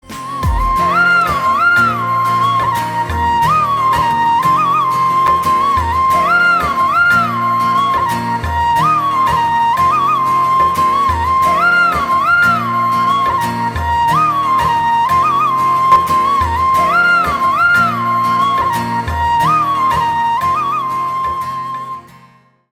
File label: Flute Instrumental Ringtone; Hindi